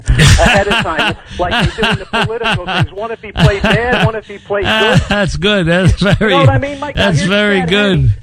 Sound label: Laugh; WFAN